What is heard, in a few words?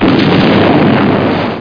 EXPLOS; Dark Forces